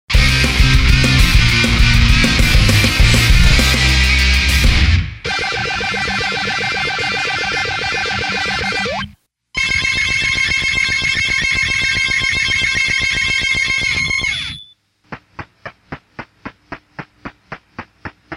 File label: Castlevania Victory, 8bit